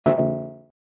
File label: error